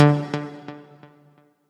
combo; quatuor

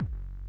wall; TETRIS2000

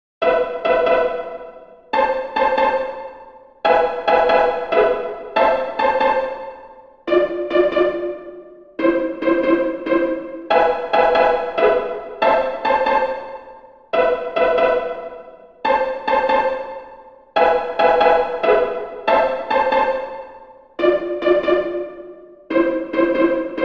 pizzicato solo; TETRIS3000

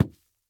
floor; quatuor

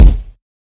damage large; quatuor

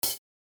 combo break; quatuor

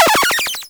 upgrade; arcade examples platform tutorial; TetrArcade

